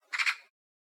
birdcall, ambient; voxygen

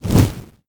fire shot

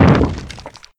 minotaur smash

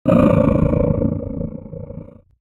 wolf angry

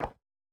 stone step, footsteps

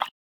running water, river sounds

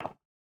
stone step, footsteps

